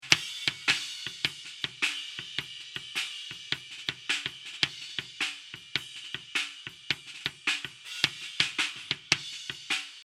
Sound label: kick 2 eq; kickdrum